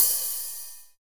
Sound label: CLEAN OHH, Drums; Drums In Your FACE!